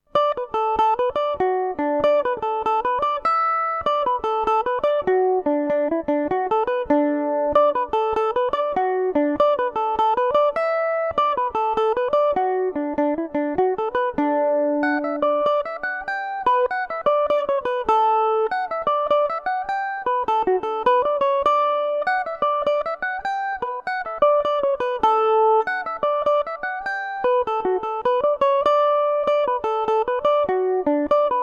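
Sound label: D major revelation, irish mandolin; Tom Lennon’s; Revelation Jaguar electric mandolin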